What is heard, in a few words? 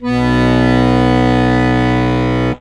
HOHNER, akkordeon